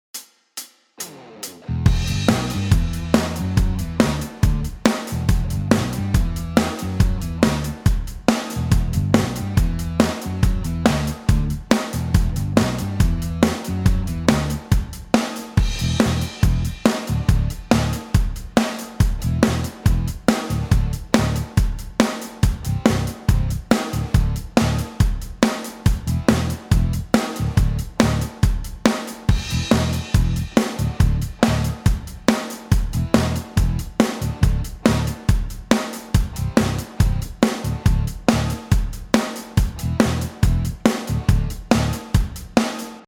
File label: backing, guitar